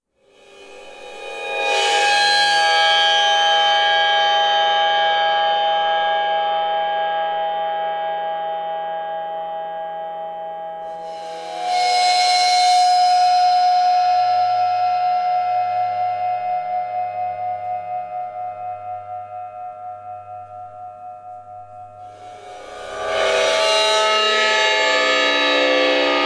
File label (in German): Becken Bogen